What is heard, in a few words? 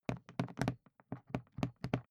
briefcase plastic handle handling; industrie wirtschaft; bueroarbeit